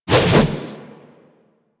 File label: Dodge Whoosh; Warframe; Wraith